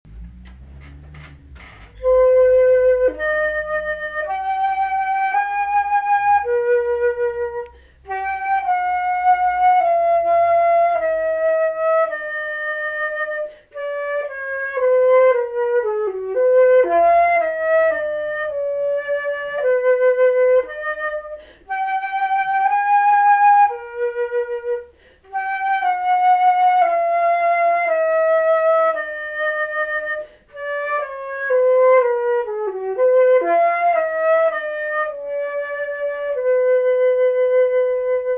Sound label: canon flute; the flute playing the Royal theme